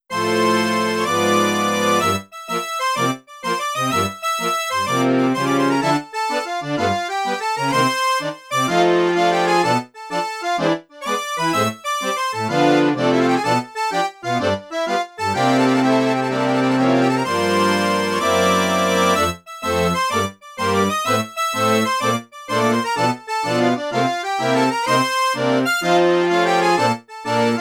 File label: Folk Dance-ish; Street Corner Live Heartwarming